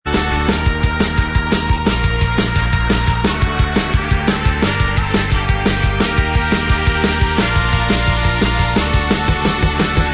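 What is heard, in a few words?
instrumental, backing track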